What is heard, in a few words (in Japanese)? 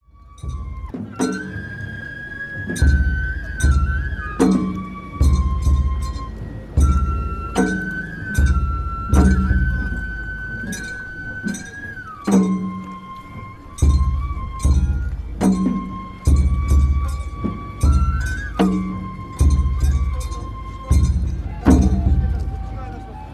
弥生祭お囃子; 東和町